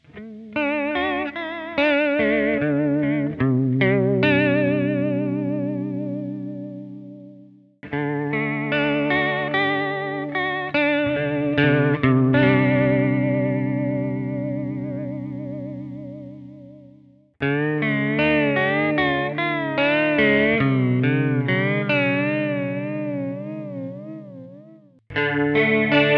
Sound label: envelope vibrato; molecular patches